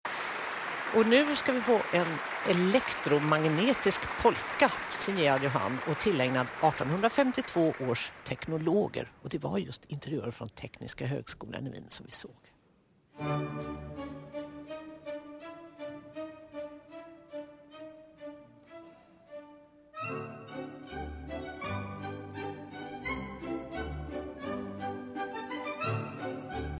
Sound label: Polka; Johann Strauss